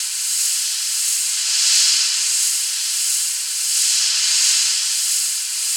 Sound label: ATMOS HUM; Spectrasonic Distorted Reality 2